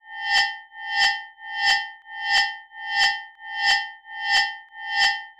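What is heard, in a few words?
90 BPM, rhythm loops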